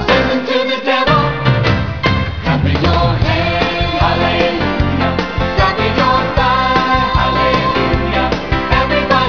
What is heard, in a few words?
clap, choral excerpts